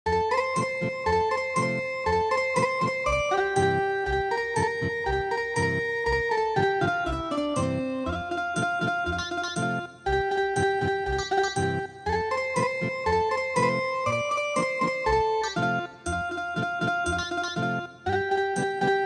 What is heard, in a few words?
Ethnic Guitar